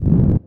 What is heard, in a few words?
respiration hover